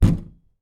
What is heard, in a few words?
Closet Hit